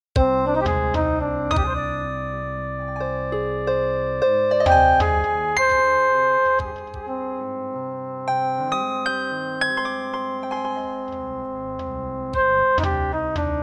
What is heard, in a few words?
Fractal tune with beat